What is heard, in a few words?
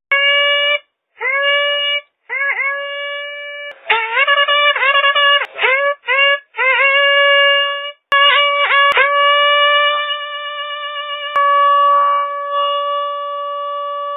Hunt horn calls; foxhunting